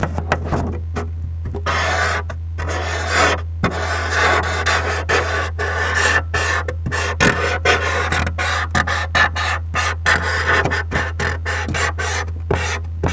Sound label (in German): beachone filz plaste; Pistazie und Konsole